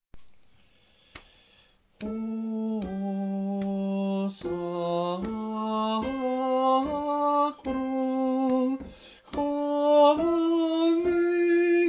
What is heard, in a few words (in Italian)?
soprani, coro; Coro Isola del Giglio